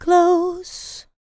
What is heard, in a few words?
LICKS, Vocal R&B Dance; LT DANCE